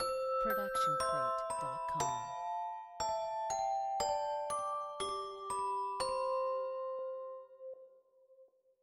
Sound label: christmas music box; soundscrate